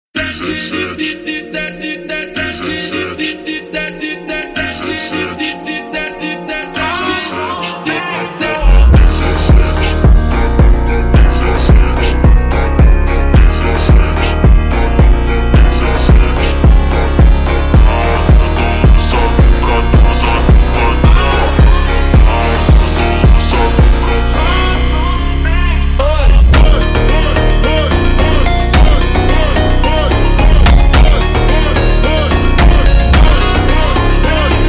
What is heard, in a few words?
Funk super Slowed